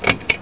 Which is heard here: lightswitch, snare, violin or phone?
lightswitch